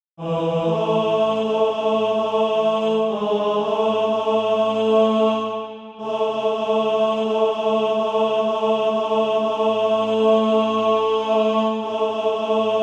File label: ten, SATB including Practise Music